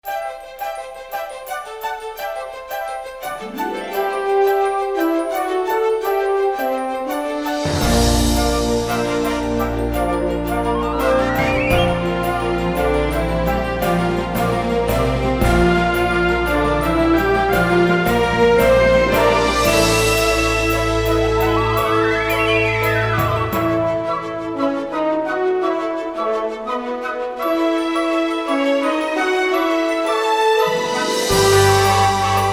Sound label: Orchestral